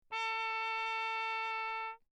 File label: TRP MUTE Lechner; D Wick; Perinétventiltrompete; Mutes